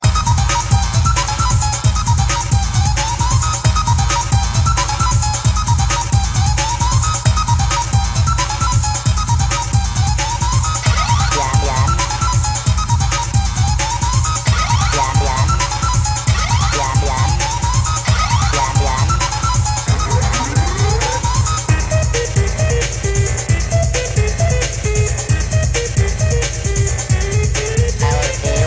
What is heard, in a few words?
techno man